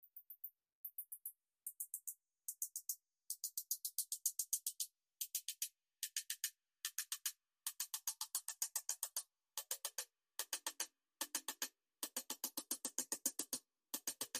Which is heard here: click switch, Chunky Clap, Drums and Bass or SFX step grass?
Drums and Bass